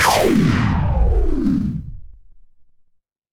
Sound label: magic scifi laser